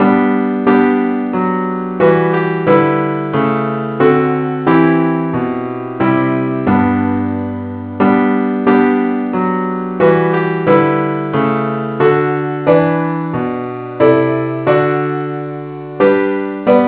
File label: parts only key g